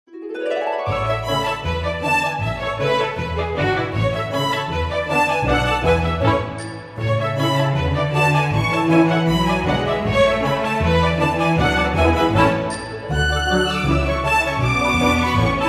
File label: Classic TV Happy Family